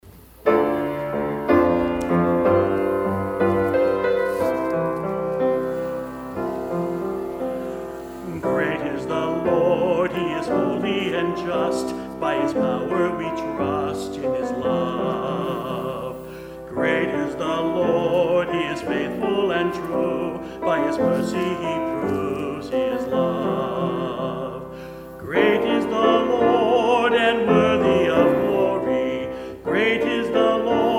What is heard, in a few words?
Closing Hymn